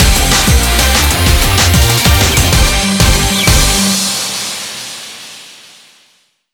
終了ジングル; GK DREAM

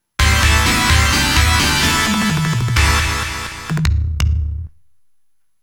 ゲームオーバー; ImageFight 1989

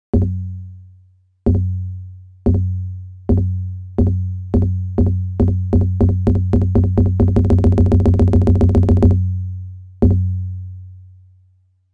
ファンファーレ 披露・太鼓; Nobunaga's Ambition Tenshouki; PC-98

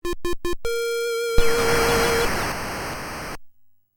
ファンファーレ (PSG版); Xak; MSX

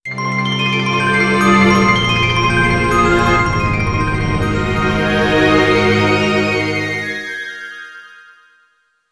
ジングル; Indigo

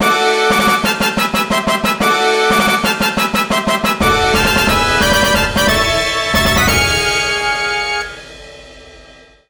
優勝校ファンファーレ; River City Melee Mach; PS4

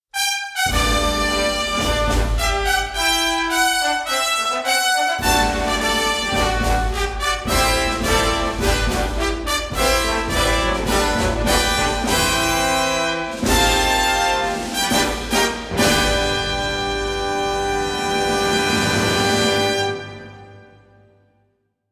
デイリーレース ファンファーレ; umamusume pretty derby winning live 12 2023

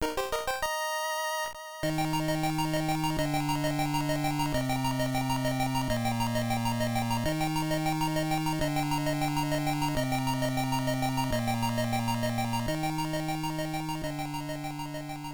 レベルアップ; Medarot

